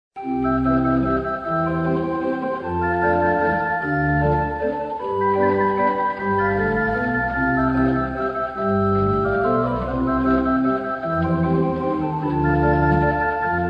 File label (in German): Walzer in C-Dur